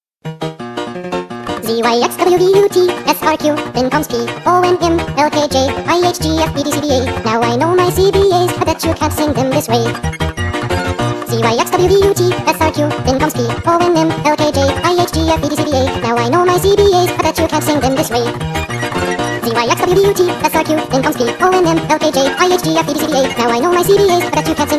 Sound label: Funny Abc Song